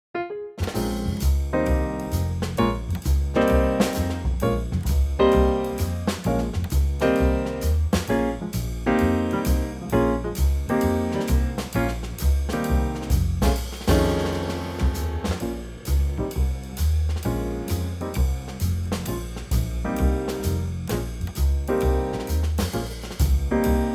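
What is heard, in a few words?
Bbm Trio, Backing Tracks for Male Vocals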